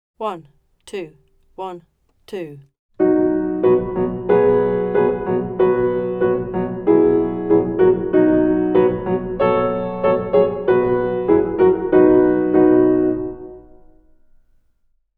Mattachins backing

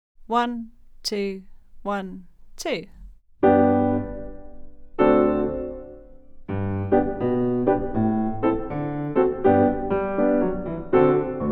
The Kesh Jig backing

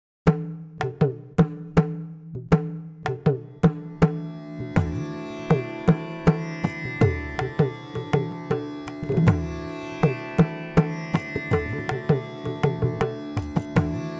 Raga impro backing; Saransangi

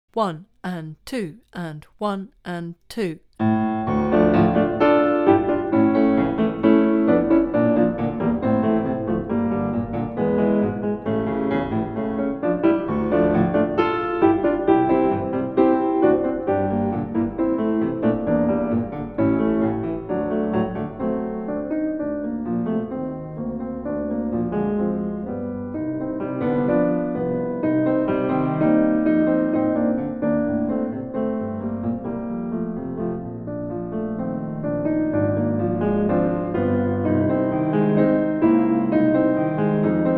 Brahms Ballade Op. 118 backing

